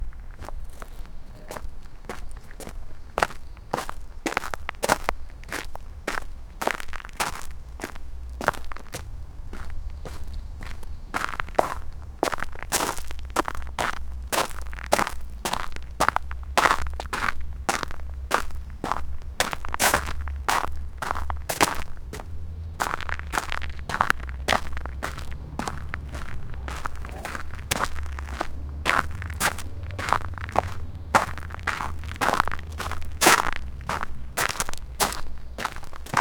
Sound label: footsteps on gravel